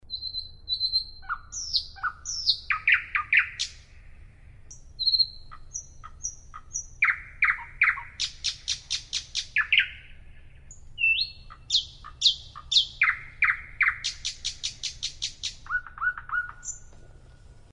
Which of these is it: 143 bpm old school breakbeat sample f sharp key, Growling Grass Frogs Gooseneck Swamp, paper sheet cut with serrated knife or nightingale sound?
nightingale sound